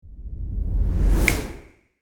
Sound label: Cinematic rise and snap; logo animation